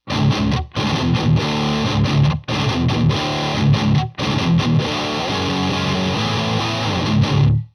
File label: Metal 1x12; Metal–Jet City 1×12